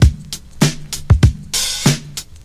98 bpm drum groove D key, Free drum groove - kick tuned to the D note. Loudest frequency: 2055Hz